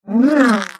Creature

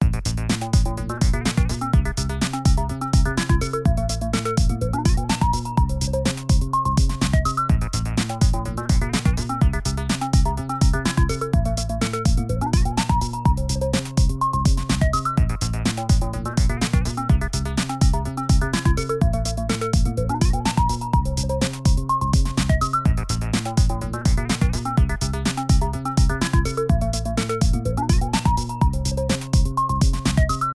Electronic Info Loop

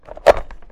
Remove Wall Socket

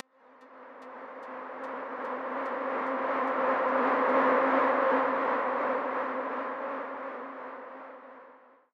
Creeping Monster Hardstyle

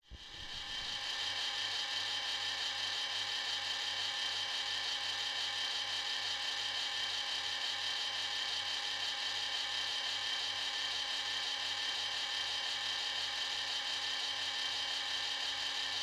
Motor

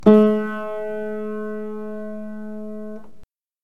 Piano

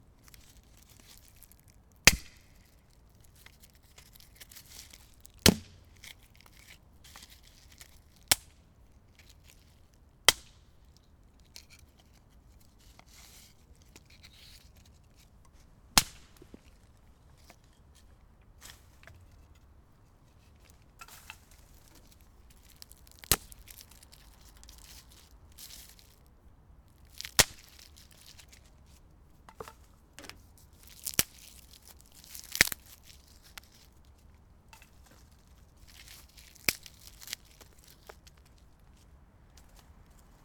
Breaking Sticks

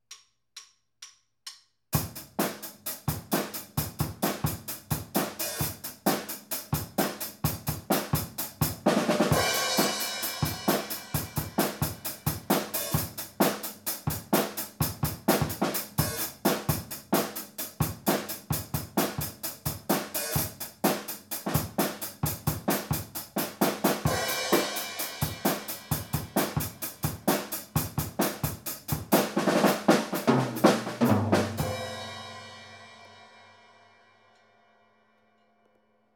Rock drums

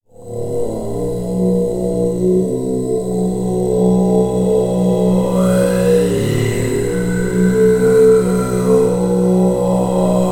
Frog

